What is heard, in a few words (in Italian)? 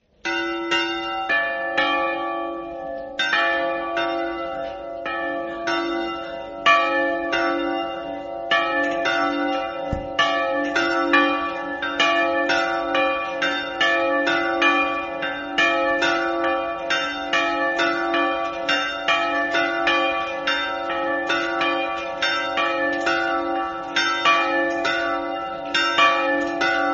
campane; ssalvatore